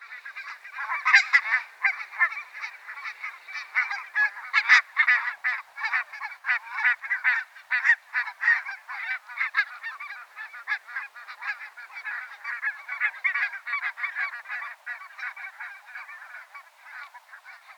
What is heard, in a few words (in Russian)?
beloschekaya kazarka golos